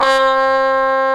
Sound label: BRS TP, Roland, TRUMPETS; Roland - LA Composer vol. 1